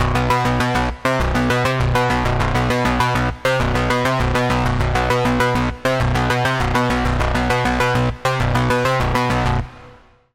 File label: Pluck, Sylenth1